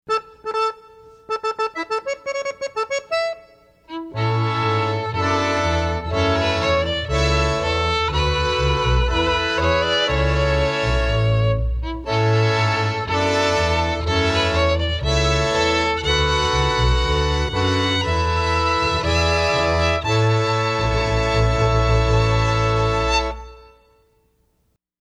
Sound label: fanfare